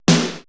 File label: 80S DRUM SET